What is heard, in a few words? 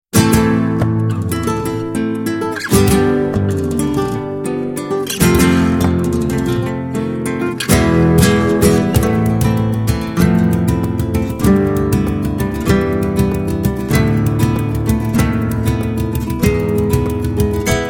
Rumba Trio